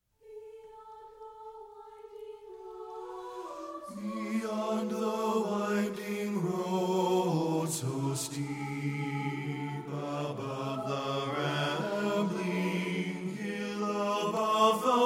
BASS; satb

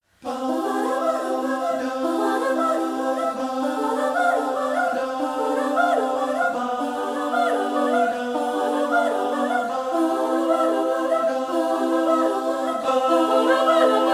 VOCAL PRELUDE IN C MAJOR; satb; partbypart

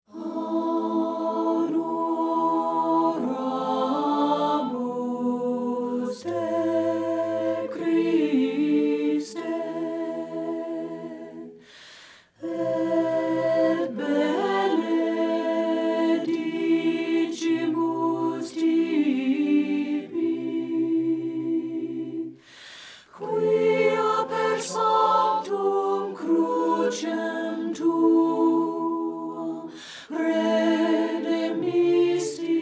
alto; satb

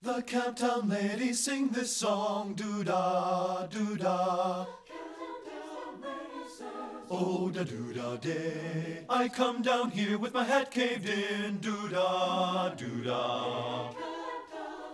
BASS; satb

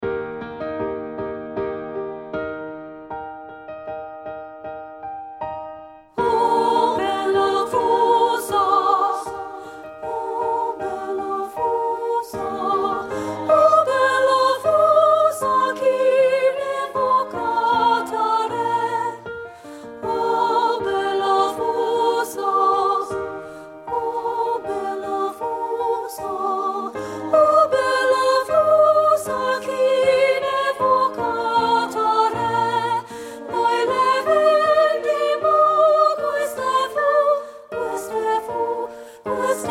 SOP 2, treble; partbypart

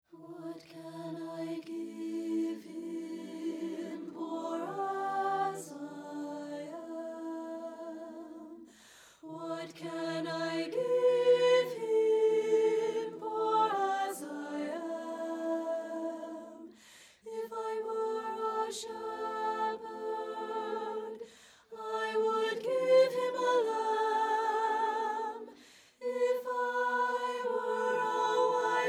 MY GIFT SOP; partbypart; satb

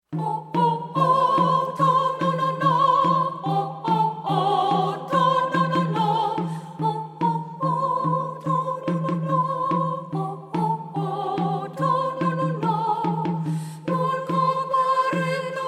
Bel Festino SOP; partbypart

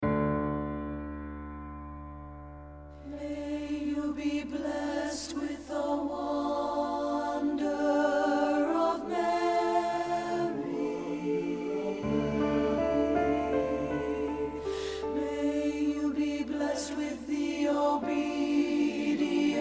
alto; satb

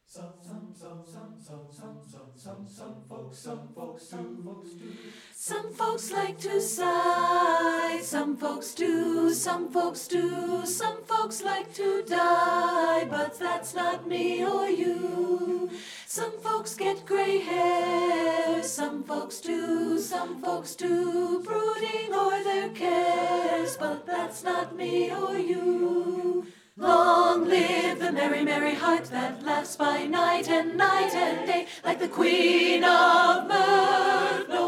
ALTO; satb

